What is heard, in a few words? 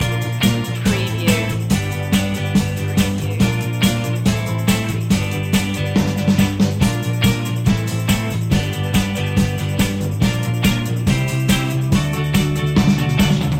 rhythm kings loop; urban-rnb; pop